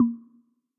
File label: Tick